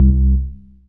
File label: DM BAS, Dance Mega Synth